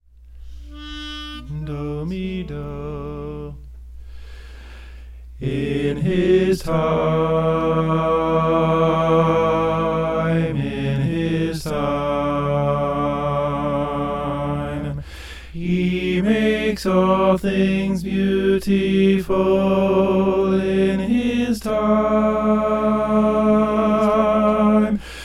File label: soprano